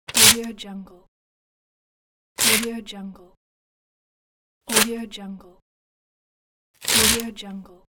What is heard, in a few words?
small pad paper tear off; applehillstudios